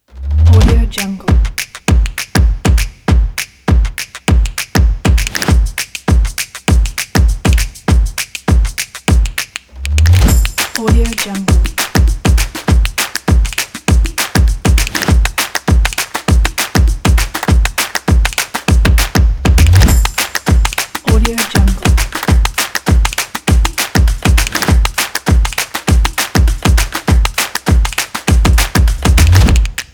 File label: stomp and percussion